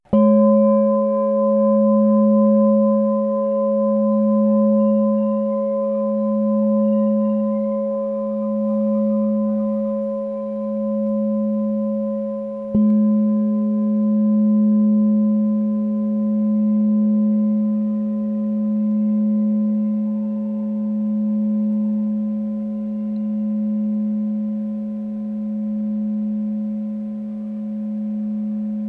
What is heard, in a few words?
7inch LOW Gs